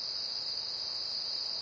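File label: insects loop; video game; source engine; portal2; ambient; nature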